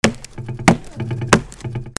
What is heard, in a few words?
WATER